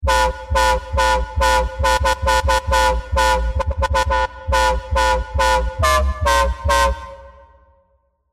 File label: COMP FM HIT